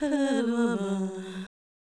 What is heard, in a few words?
Fem Vocal 128bpm G FX, African Voices